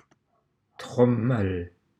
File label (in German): trommel; Wo hörst du O,o?